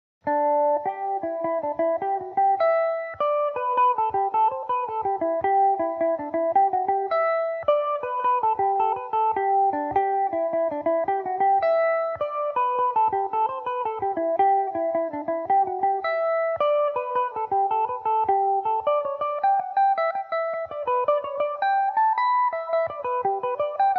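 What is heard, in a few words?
maids of selma G major revelation, mandolin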